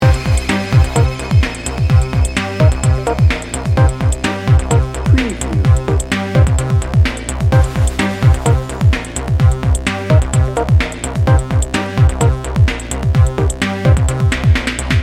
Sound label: future innovation loop